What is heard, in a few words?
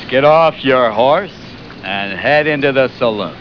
saloon; Get off your horse, and head into the saloon